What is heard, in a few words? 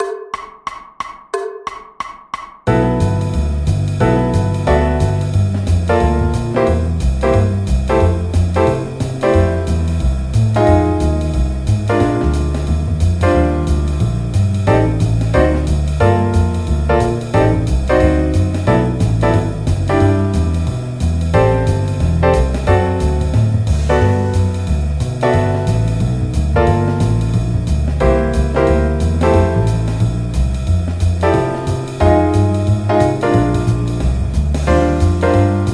Piano and Guitar Audition Track